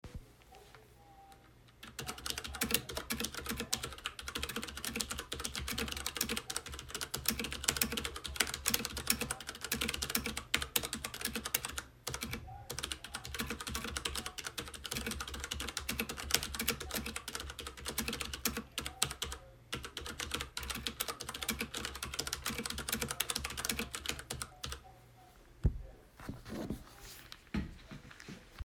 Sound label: WASD Keyboard